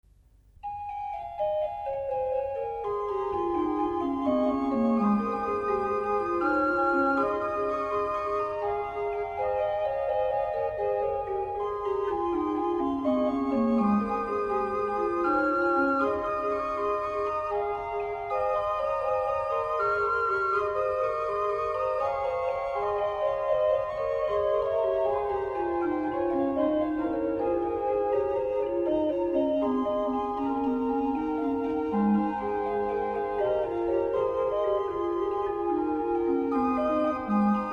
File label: Das Orgelbuchlein Christmas In dulci jubilo, Bach Organ; BWV 608